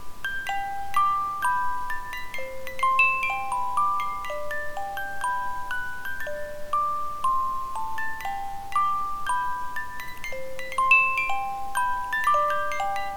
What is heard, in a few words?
Music Box